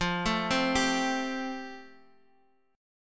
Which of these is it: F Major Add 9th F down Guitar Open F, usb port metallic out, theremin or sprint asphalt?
F Major Add 9th F down Guitar Open F